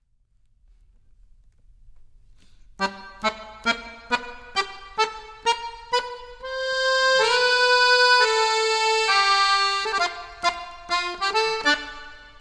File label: Accordion, Jazz for piano